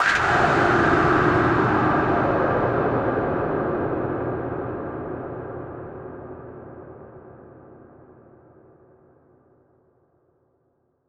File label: burst ghostly wind gust